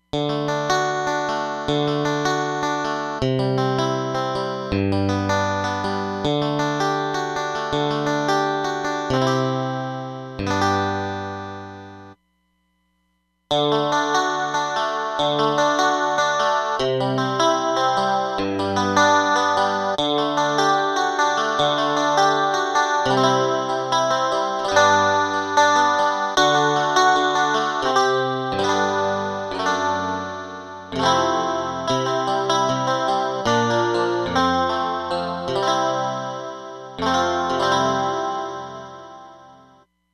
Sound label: Chorus; Roland DEP-5; Factory Patches